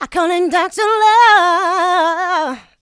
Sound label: Female Vocals; Houseworx